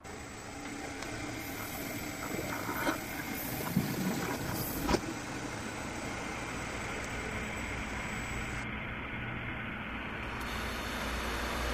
Ship Shutter Thumping, industrial

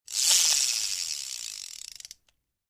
Fishing; Reel Plays Out Line Quickly, Casting.

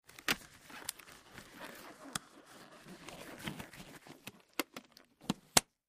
Surgical Gloves Put O, gloves

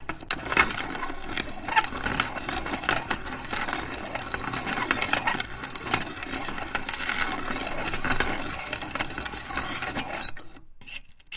Metal Grind Turning, grinders

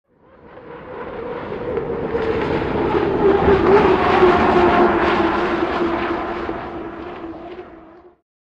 Hydroplane By Fast

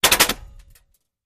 Bullet Impacts Metal

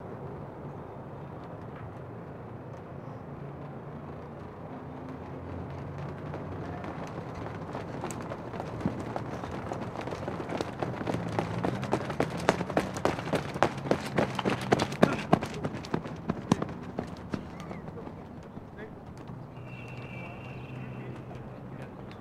Run Lng Loop Ambience, run bys

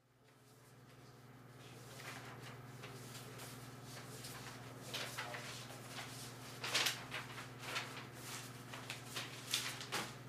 Garment Pressing Machine In Room, W Voices, M; Garment Pressing Machine In Room, W Voices, Medium Activity, Fan Noise.

